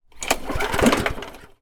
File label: household lawn mower petrol starter cord pull fail